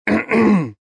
Clearing Throat, Male clearing throat, short; VoiceBox

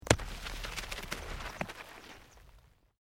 ROCK TUMBLING

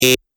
Electronic Buzzer Short

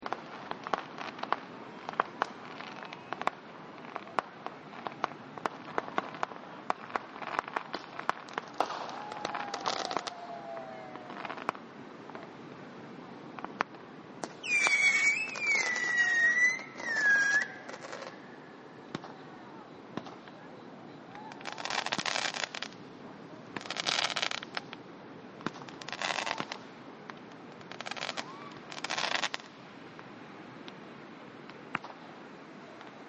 Fireworks On The Beach; Chinese New Year; Partners In Rhyme

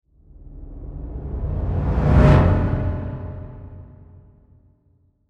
Reverse Whoosh; Production, Element, Riser, Reverse, Debris; Ascending

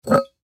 Pickle Jar Pick Up; Pick Ups (Sound Effects:Objects); Foley; Blastwave

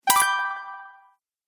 Loot Bonus, video game

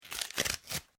Cardboard tear; Material components for constructing more complex sounds

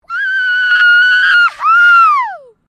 FEMALE SCREAM WOO HOO; Female vocal sounds; Screaming; Voice

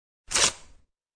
Paper Rip, A quick ripping of paper sound; Classroom Sound Effects; Schools

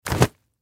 Towel Wet Drop, Towel Wet Drop Slow Floor; Bathroom; Household

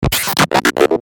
GLITCH WARBLE, Glitch Warble Stutter; Production Element Imaging Element Accent Transition

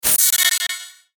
Stutter Zip Whoosh

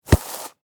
Soccer Ball Dribble, Single; Sports; Soccer (Sound Effects:Sports); BlastWave

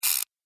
Clockwork Mechanism Turning Sound; Andrew Fleming

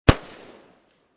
M16 Bridge, Assault Rifle; Assault Rifles; Guns; VRSonic

